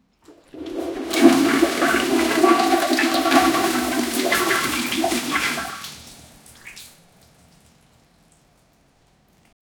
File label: hoovering a flooded bathr, bathroom